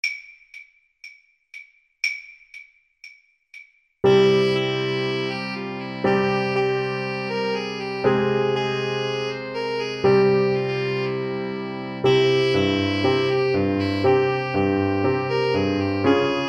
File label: hava nagila, Clarinet-Saxophone Duet